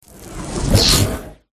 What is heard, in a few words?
jelly fish boss attack voice mid